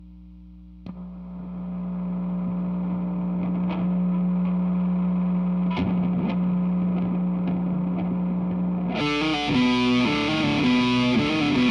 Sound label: GTR; 57